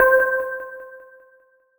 Nervous Oneshot C

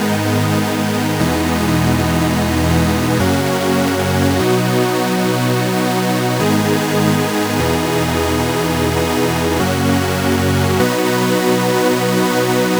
Drop Loop 150 Ab; embers; Foster

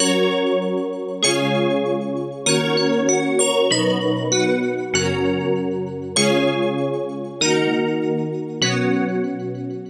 Keys Bells; hera

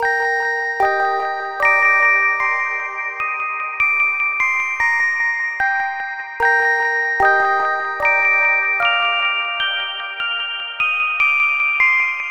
keys little bells; odyssey

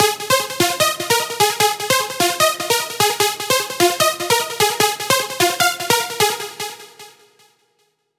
Synth Loop Assemble F; knights; Galahad

